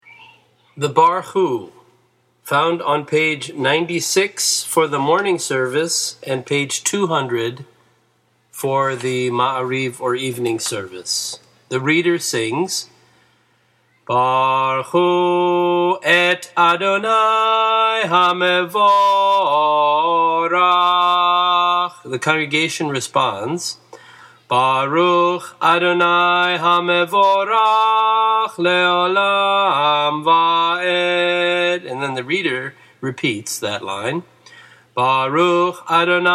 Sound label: barchu traditional conservative melody